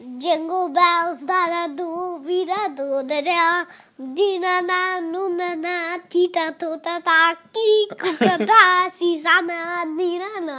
new version of jingle bells

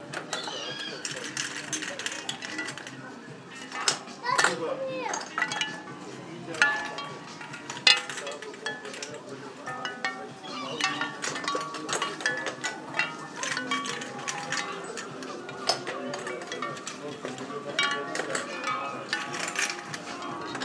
shell wind chime; mevagissy

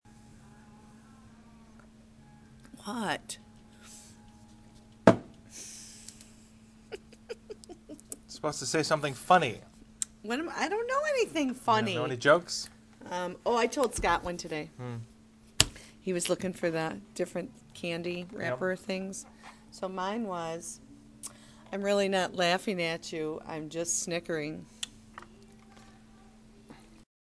snickering

